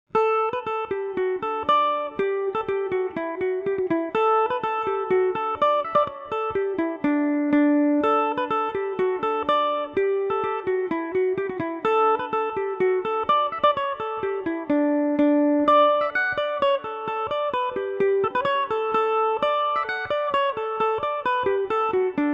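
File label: D major, Irish mandolin; kerry cow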